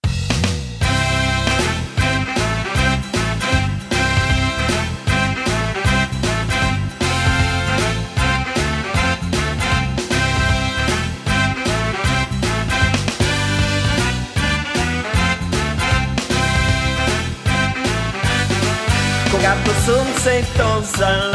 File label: ROCK AND ROLL; BULGARIAN